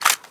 pp19 mag out; bizon; garrysmod

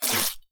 quikclot open, weapons, ifak; garrysmod; item ifak

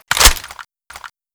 scar clip in; garrysmod

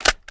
sg550 clip in; weapons; garrysmod

